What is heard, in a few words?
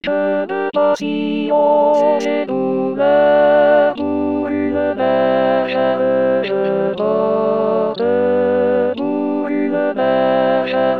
alto; rimailleurs